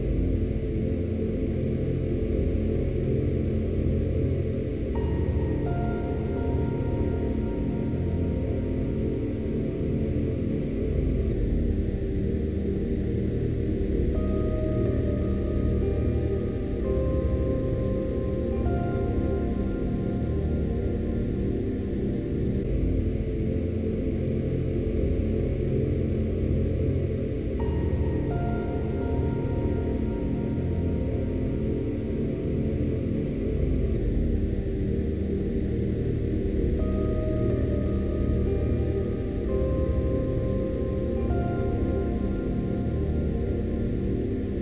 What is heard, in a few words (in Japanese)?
夜のイメージBGM; Luminous Lake